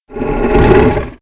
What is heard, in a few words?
Werewolf Attack; Playable Tutorial Level